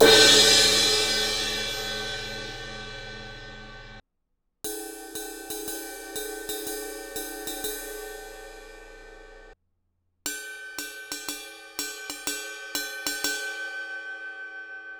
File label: 18 inch crash ride bell, cymbal; aax x-plosion; Sabian